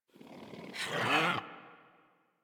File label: Puppy Idle Proud; Warframe; Kubrow